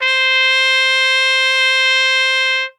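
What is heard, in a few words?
trumpet C hi mute straight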